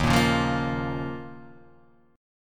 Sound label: D sharp 7th Suspended 2nd